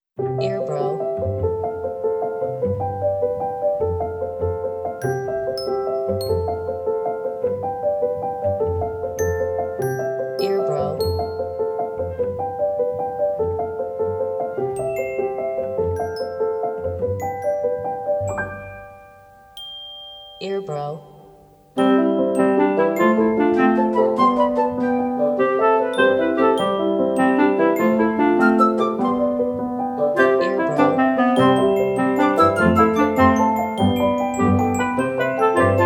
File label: Bubble Bubble